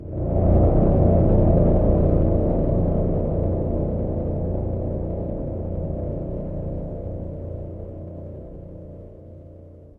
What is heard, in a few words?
horror drone